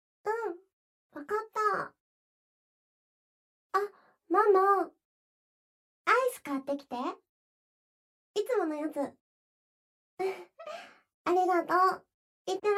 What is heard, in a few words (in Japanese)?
おにいさんの耳、あの日みたいにたくさん舐めてあげる